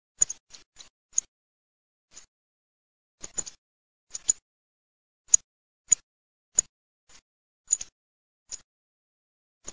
TORDO

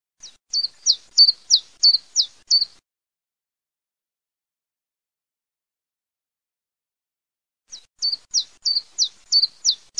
LUI PICCOLO, birdsound

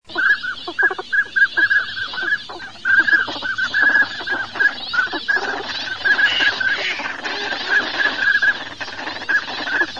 CODONE CODONE

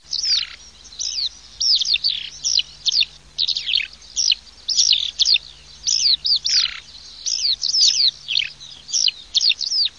ALLODOLA

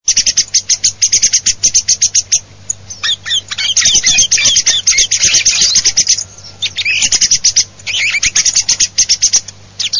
schiamazzo, birdsound

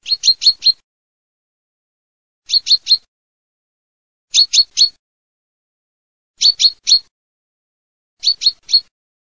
FRINGUELLO

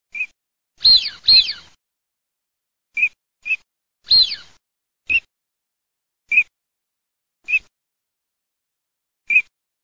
ALZAVOLA MASCHIO FISC MASC

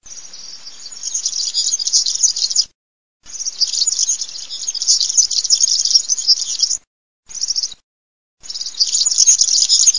venturone

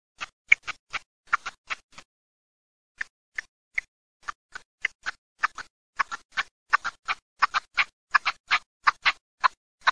PERNICE ROSSA

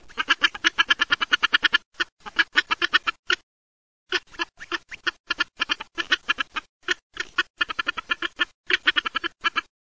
oca delle ande

